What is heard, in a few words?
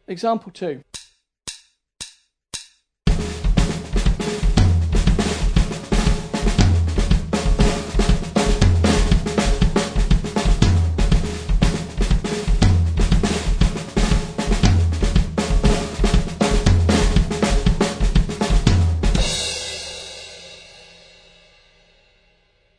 drum set; Independence day 20